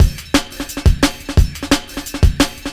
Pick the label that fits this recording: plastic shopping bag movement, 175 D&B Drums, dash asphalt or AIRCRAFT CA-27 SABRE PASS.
175 D&B Drums